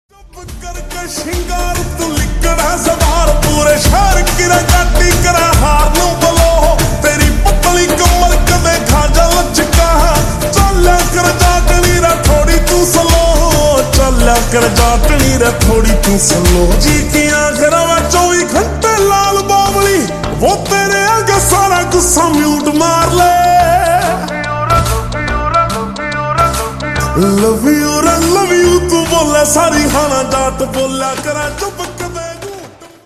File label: Slowed Reverb Ringtone